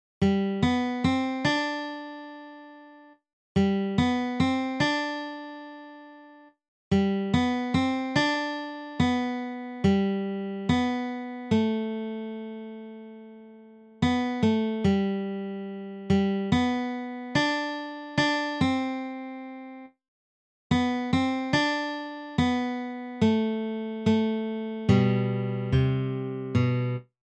when the saints go marching in guitar

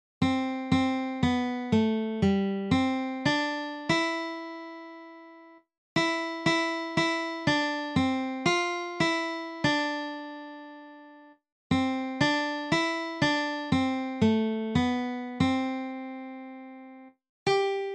guitar

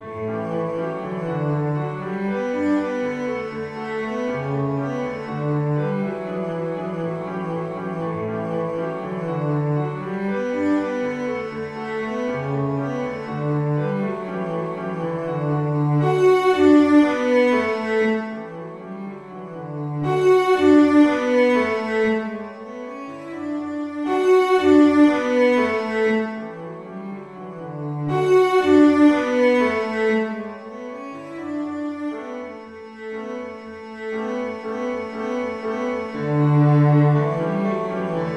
can can cello

